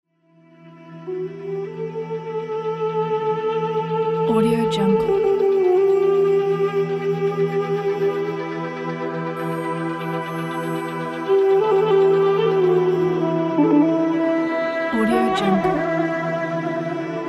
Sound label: ramadan sunset opener